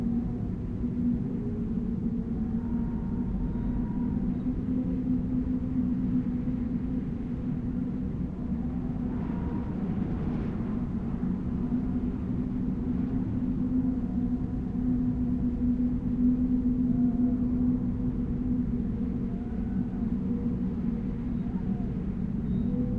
Garden Enviro Loop

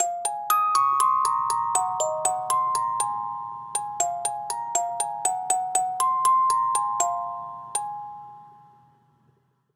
Music Box; Assassin's Creed Syndicate

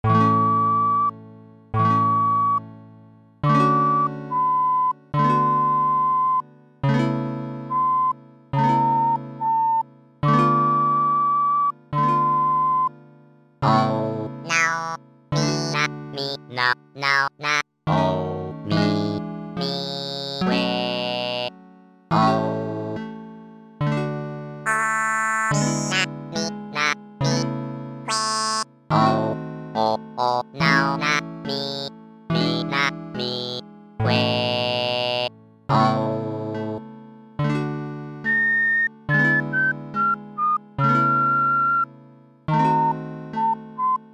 Chorale (LIVE); animal crossing full gamerip

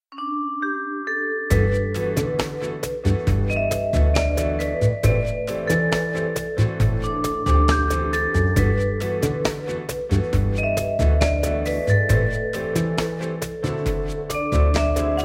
music mall; guns n glory zombies android game music

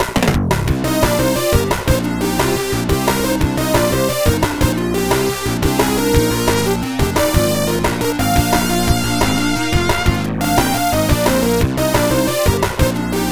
Goal Kick

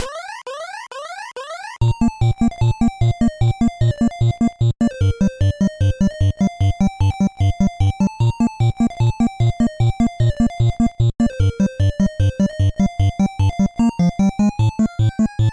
Room Theme Hurry Siren; Bubble Bobble; NES

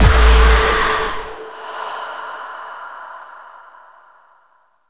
Sting; SeargentPart3

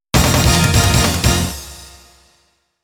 Midway Participation Jingle; Shin Gouketsuji Ichizoku Toukon Matrimelee

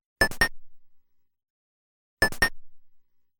Treasure Chest & Gate Opening Sound; Kaijuu Densetsu The Tale of the Shell Monsters

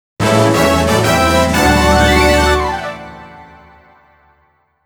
Fanfare (Victory Jingle); Genso Suikoden IV Original Soundtrack

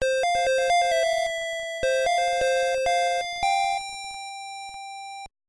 Horn; Legend of the Ghost Lion nes

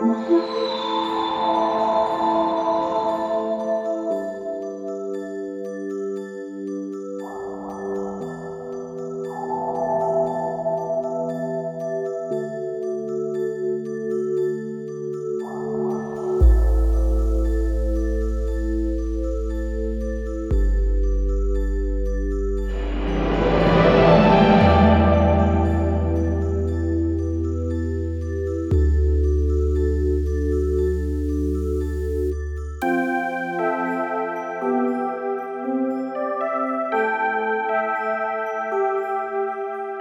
Chill; Haven

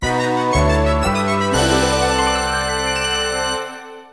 Table Tilt Finish; Wii Fit

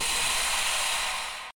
air spray; lucius ii the prophecy gamerip 2015 pc macos linux

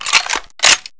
reload sniper Chamber

